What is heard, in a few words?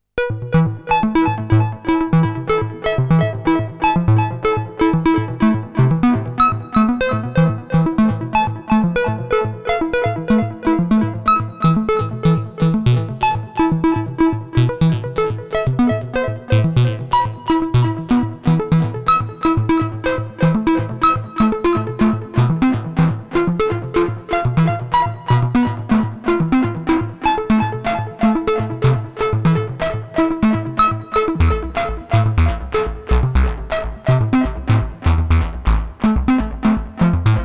Sequence Saturation; Xaoc Devices Sofia